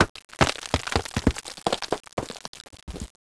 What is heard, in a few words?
rocks